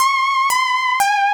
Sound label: Beat